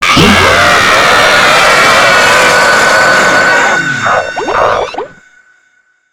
faster jumpscare

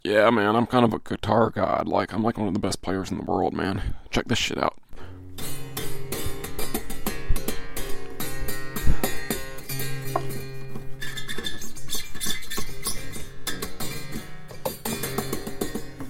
guitar

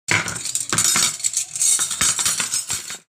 dishes and silverware

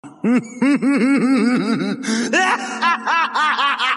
laughing; garmadon

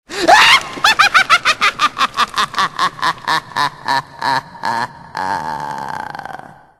laugh; cryptkeeper

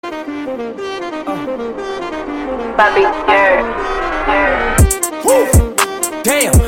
wooh damn song

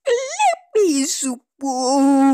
logo sound; klapy csupo

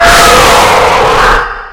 screamer; funland harold

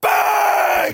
baaaaaaaaaang

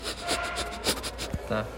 snif

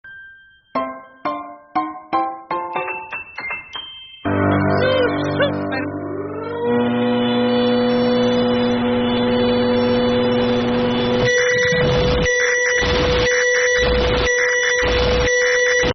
super microwave; super microwave brothers

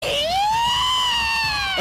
screech; tenko

